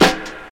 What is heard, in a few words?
Tight Low End Acoustic Snare G Key, snare single hit tuned to the G note; snare drum samples